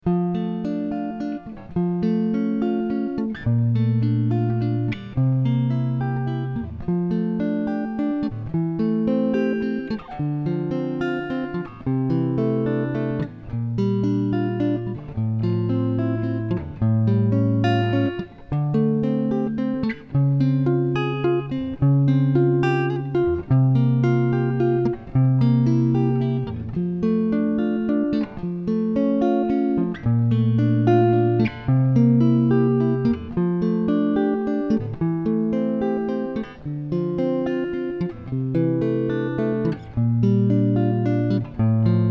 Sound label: accompaniment